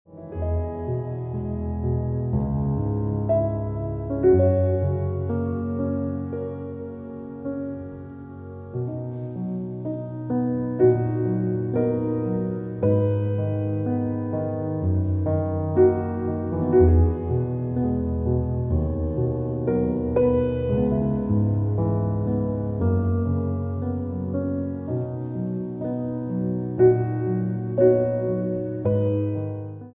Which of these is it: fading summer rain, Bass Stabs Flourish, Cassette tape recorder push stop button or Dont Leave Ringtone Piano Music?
Dont Leave Ringtone Piano Music